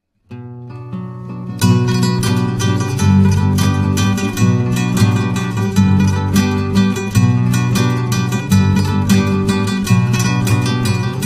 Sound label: turkmen gitara